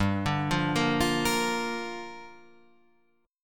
G Minor 7th, Guitar, Half Step Down

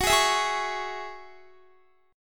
Gb 7th Suspended 2nd Gb down Guitar; Gb7sus2 strummed; 4E,4A,5D,5G,5C,6F

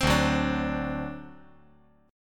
D 7th, down

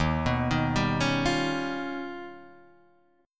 D sharp Augmented 9th D sharp Guitar Open D

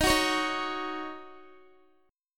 Eb Minor 7th, down, Guitar; Ebm7 strummed

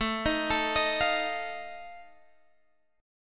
Eb Suspended 2nd Flat 5th, A

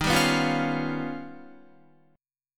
Eb Augmented 9th Eb down Guitar; Eb+9 strummed; JGuitar

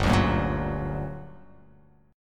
G Minor Major 7th, down

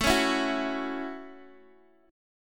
A 6th, Guitar, Open D

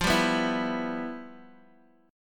E Minor F, chord; Em/F strummed; JGuitar

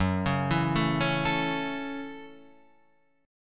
Gb Minor 7th

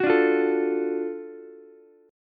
Gb Minor Major 7th Sharp 5th, Ukulele, chord; strummed; JGuitar

